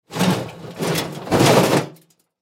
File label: Garbage Can Drag, Garbage Can Drag Over Dirt Rock; Household; Blastwave